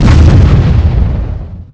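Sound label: Large Explosion; freeciv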